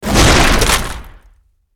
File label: Crash